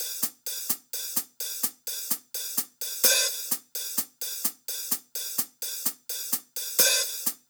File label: 128BPM Kit; Vengeance Samples; VFH1 128BPM Crowded Kit